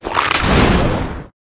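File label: Rat buff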